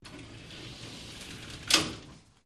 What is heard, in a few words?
Elevator Gates Doors